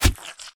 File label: yellow ball appear